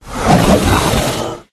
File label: attack bottom; uborg